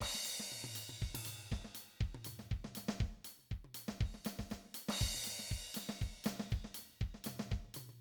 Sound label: Talk Show Background Music